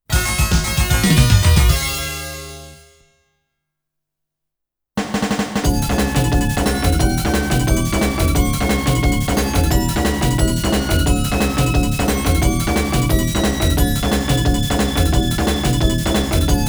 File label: Extra Fanfare ~ Samba; Soldam; Extra Fairy's Samba